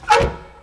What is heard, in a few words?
peep op; Mafie 1